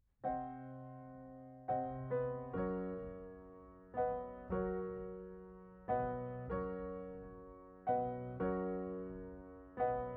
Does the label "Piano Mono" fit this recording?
Yes